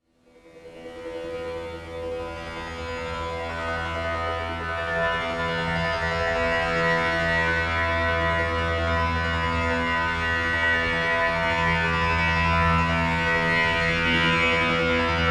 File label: Monochord